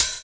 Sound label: Open Hhat, Hihat; Equipped Music; Loungin House